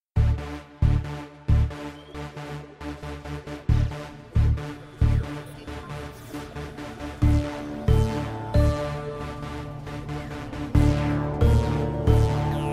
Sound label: Sci Fi Music